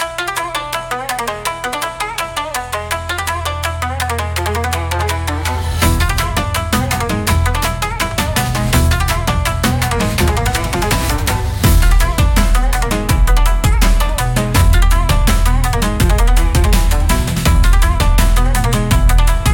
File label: Crimson Gate Charge, cinematic japanese style battles